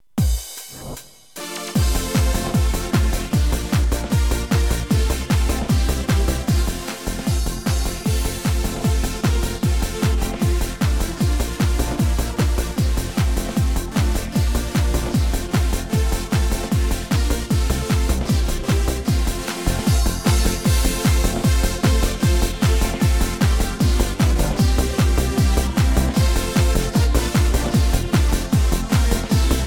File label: eurobeat